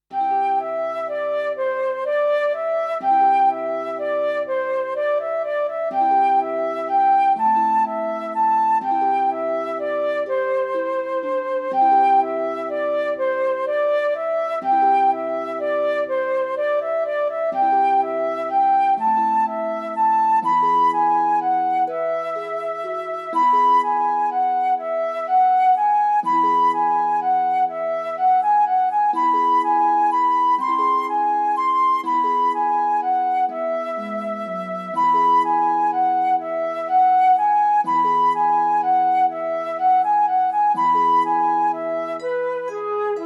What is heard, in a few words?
flute